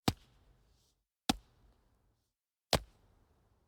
baseball into glove

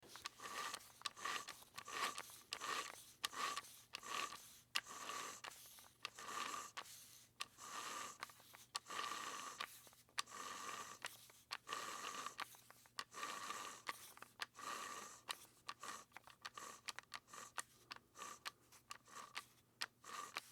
dial turning

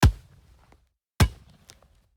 football dropkick

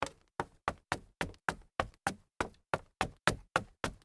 dress shoes up stairs wood

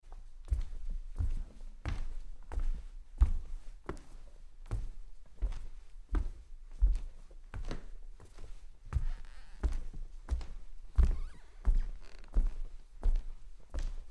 soft walking on wood, foley

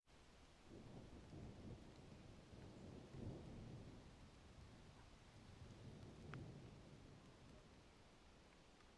low thunder rolls

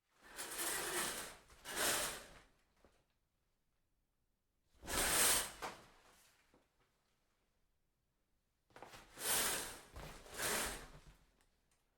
shower curtain pulled series

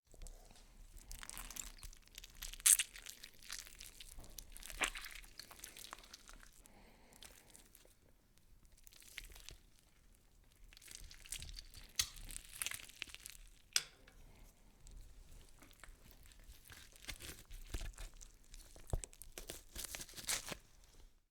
hand digging through guts

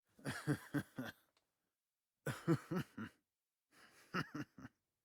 male chuckling

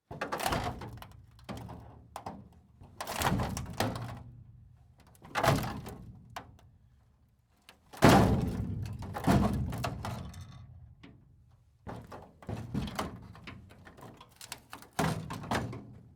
metal breaking apart

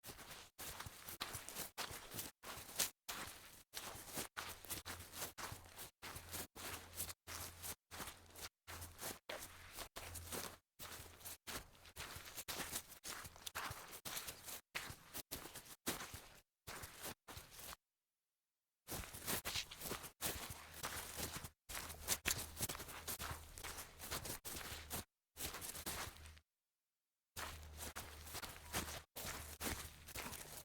walking on muddy grass

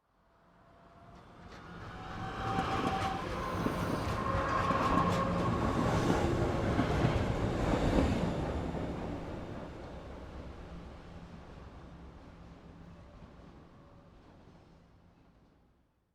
motor approaching

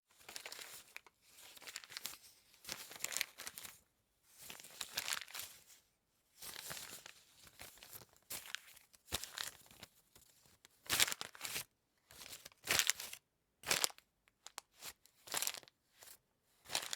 paper crunching

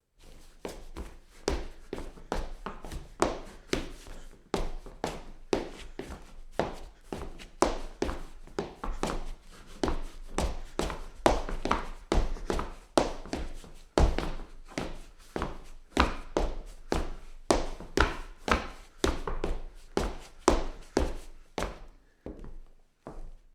lindyhop

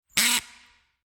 drill gear

